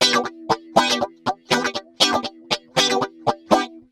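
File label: rhythm guitar pigtronix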